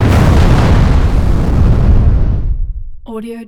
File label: explosion; انفجار بزرگ